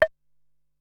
Dinky Hit, Ensoniq SQ-R-Plus

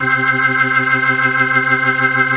C3F, B3

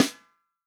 FUNK SNARE CHROMATIC, REAL DRUMS

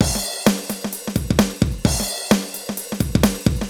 Alesis HR-16B (130BPM), beats

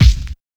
HIP KICK; Drums In Your FACE!; BONUSKIK

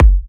F# kicks; markus hakala kicks

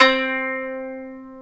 ETH KANUN, Eth Plucks, Saz & Kanun

